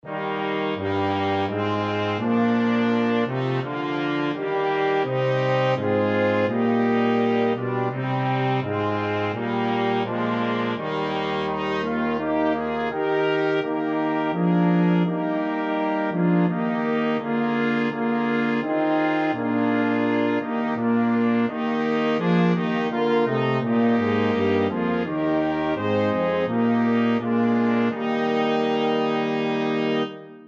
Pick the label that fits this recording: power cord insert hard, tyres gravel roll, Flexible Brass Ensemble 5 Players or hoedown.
Flexible Brass Ensemble 5 Players